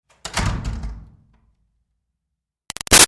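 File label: Door Wood Church Basement Close Med; Miscellaneous Doors; Big Room Sound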